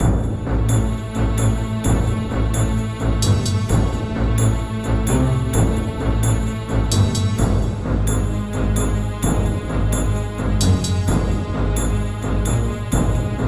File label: loop uplifting